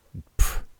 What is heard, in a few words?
"pfft"